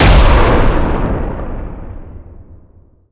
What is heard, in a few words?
flag lost hit